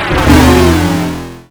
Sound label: Retro Negative Short